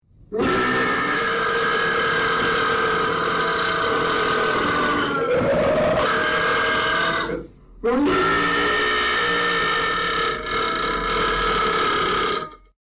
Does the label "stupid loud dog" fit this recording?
Yes